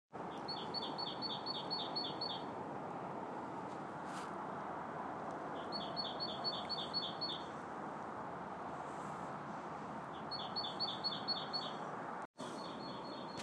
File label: sound of spring birds twittering